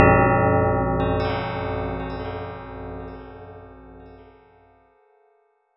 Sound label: tada; hyperrogue